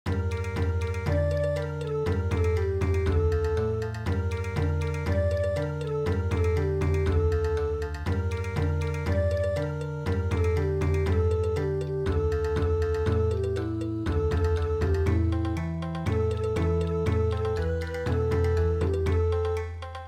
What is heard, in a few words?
Bon Odori